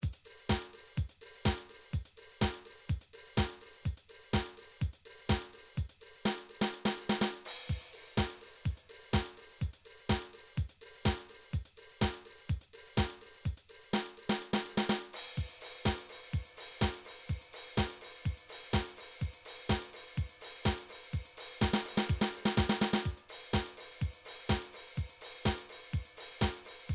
drum midi files house; Drum Midi Files Collection

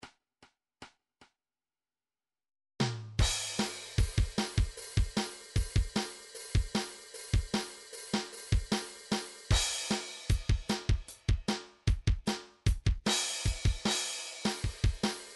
midi drum styles hard rock 152 open hat

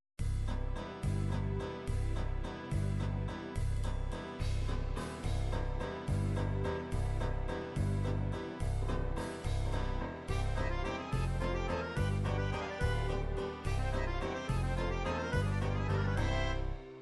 psr9000 musette, midi

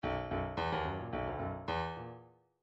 midi file grooves rock

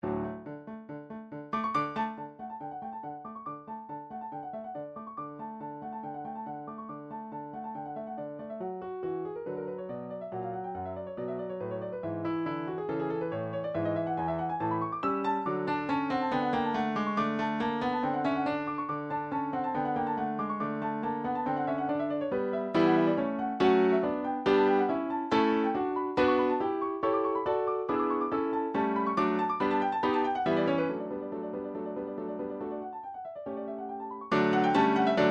German hornpipe, midi

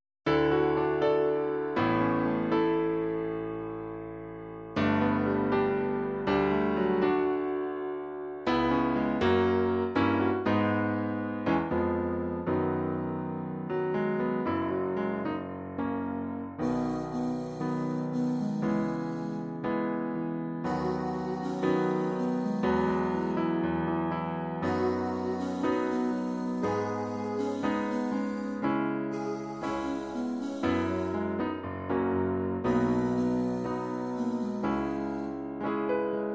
italian solo

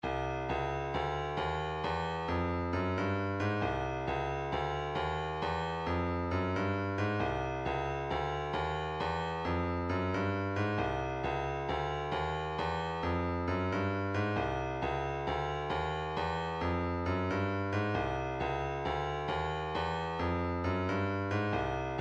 vocal planet bass bomb, midi

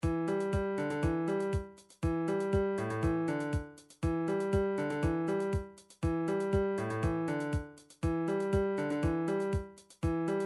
drums reggae

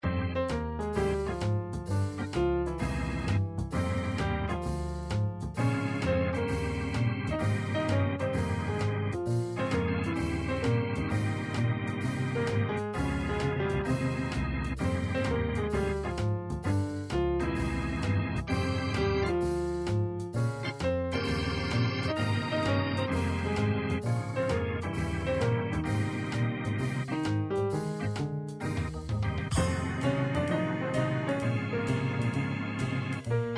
jazz, midi

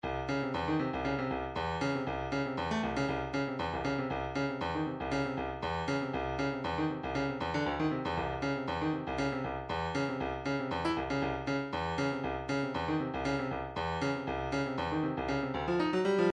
breakbeat midi, 118 bpm, herky jerky; Stylus Breakbeat Midi Files

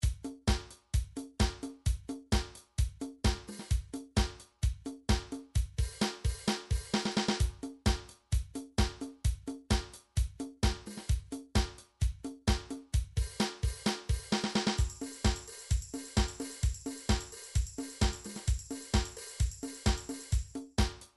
drum midi disco

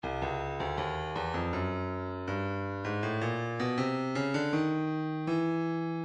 breakbeat 080 bpm; modren d; Stylus Breakbeat Midi Files

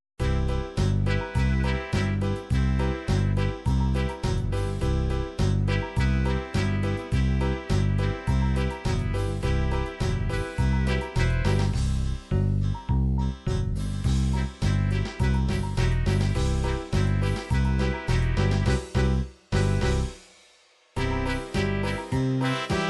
disco fox

